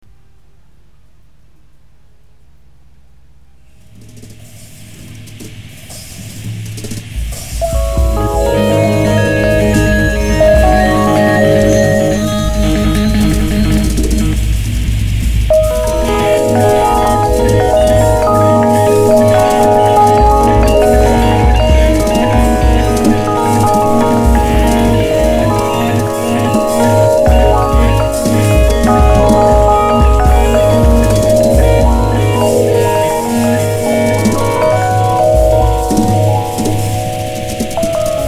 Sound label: piano